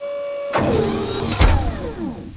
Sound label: Spread Load Snap; Defiance